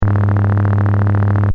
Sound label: Sci fi machine loop, Scifi Machine: Loop, Short